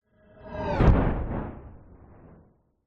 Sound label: science fiction thud hit whoosh